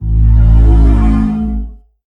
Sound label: Slot Glow